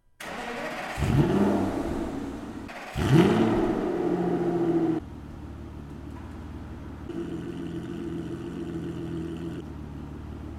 aston martin db9 exhaust sound comparison before and after installing a secondary cat delete; aston martin db9 exhaust sound comparison before and after installing a secondary cat delete kit; Bolt On